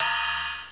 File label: RIDE CYM; INSTRMNT